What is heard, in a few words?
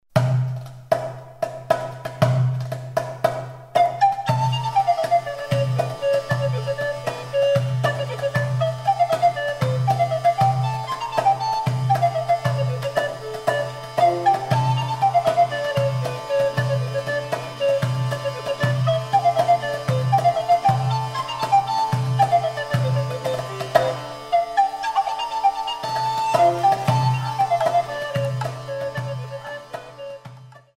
Saltarello; A Chantar